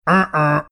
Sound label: mimicking uh oh robot